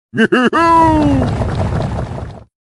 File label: laugh